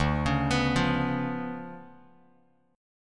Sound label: D Minor Major 13th, down, Bass Standard; DmM13 arpeggiated; JGuitar